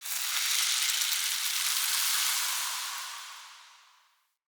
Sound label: Resource Pickup Embers